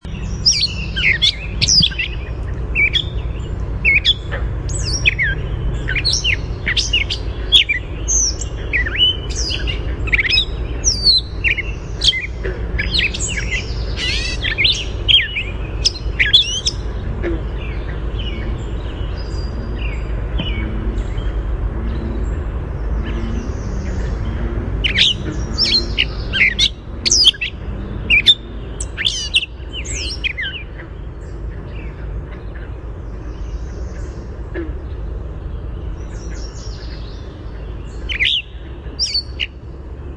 GRCA song

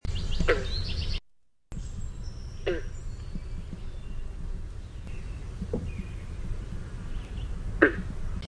green frog, Rana clamitans; Anura Ranidae; VT; Galaxy Hill

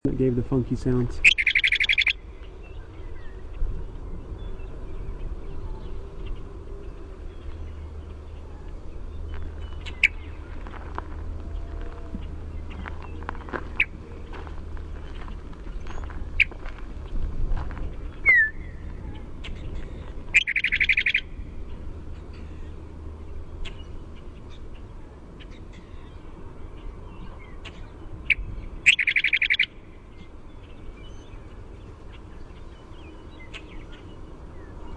WEME chupp whistle

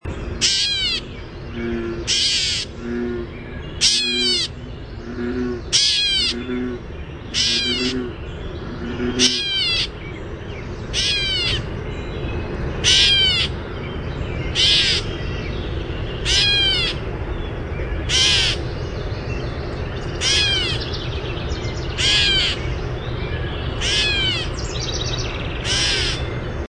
GRCA mew

